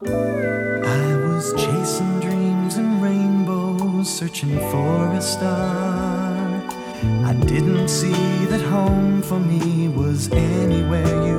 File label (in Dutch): omroep jingles